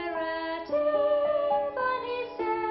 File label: sing